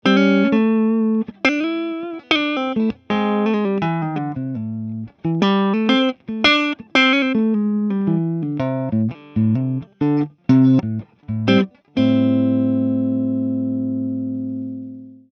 mesa boogie mini rectifier twenty-five clean pushed